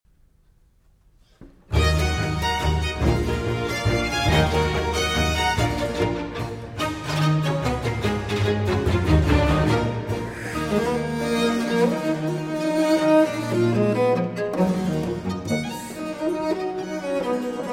Concerto for Cello and Bassoon in E Minor, Allegro; RV 409